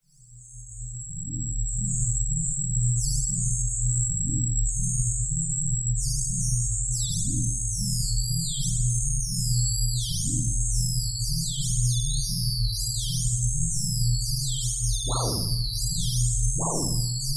Simple Sine

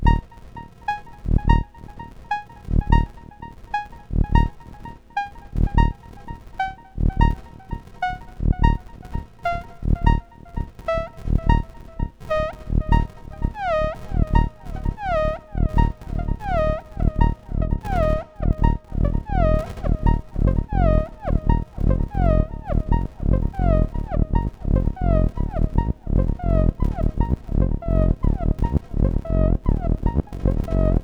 Techno Redux

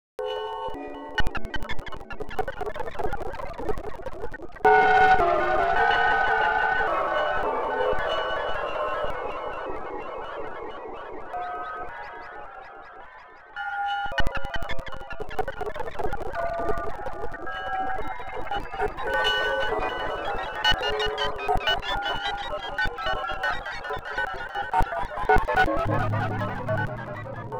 Ringing

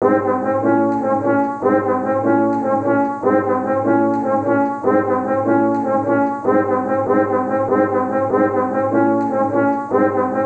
joyful noise

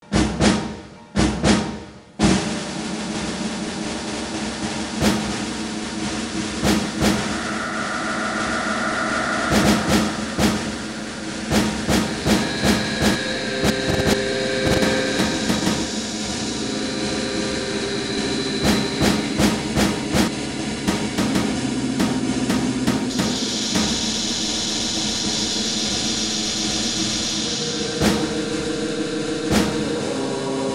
cadence

